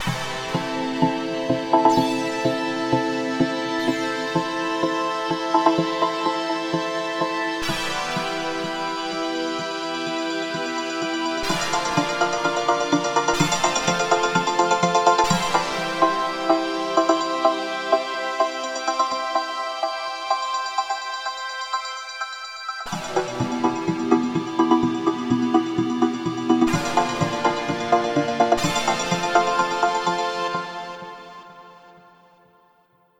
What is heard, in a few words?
Vocoder with Carrier; MegaMod